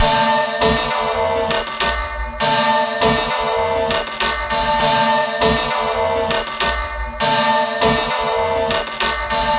Chiming Strings; Electronica

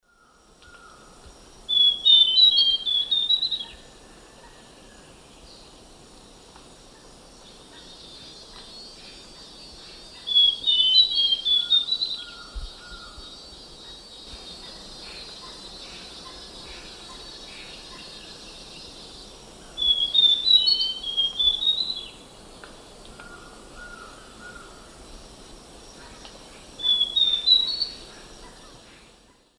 Myrmeciza castanea, duet; S. America; Eastern Lowlands

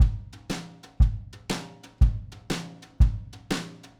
Drums, Jazz

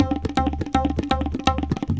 tabla ban 120

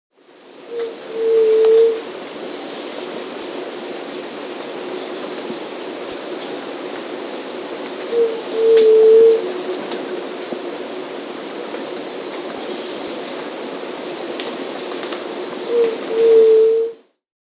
White-tipped Dove (Leptotila verreau, S. America

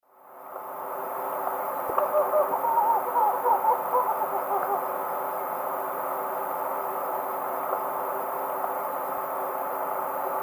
Megascops choliba, duet; S. America; Eastern Lowlands

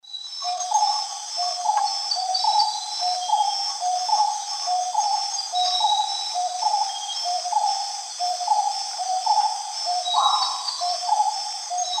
Odontophorus gujanensis, song; S. America; Eastern Lowlands

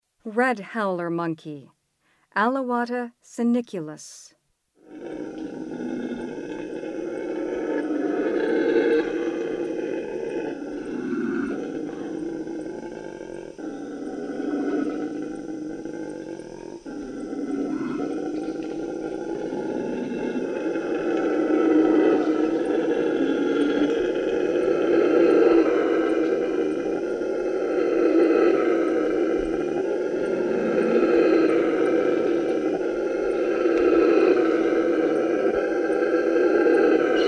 Red howler monkey; S. America; Rainforest; Primates of the Rainforest

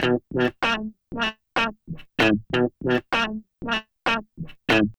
Guitar, RnB

